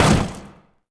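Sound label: impact sword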